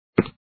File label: teacher walk sound